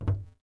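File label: Metal run left, footsteps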